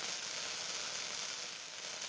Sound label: Rocket sparkles loop